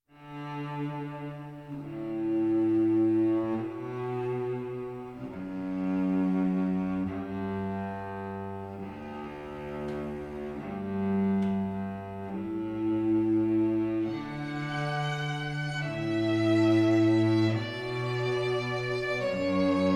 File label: Canon In D